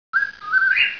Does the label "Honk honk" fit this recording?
No